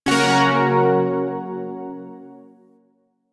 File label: Shawzin Yo Chord I; Chords; Warframe; Zariman